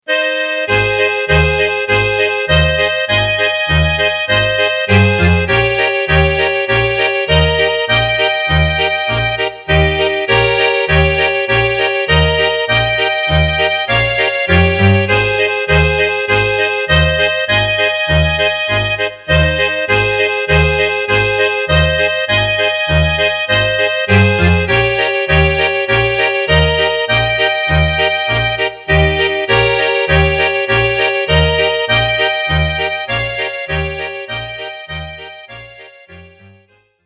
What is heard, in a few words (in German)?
Musig